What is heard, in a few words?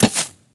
Crossbow; ycrpg